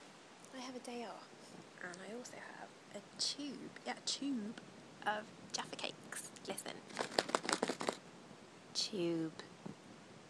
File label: tube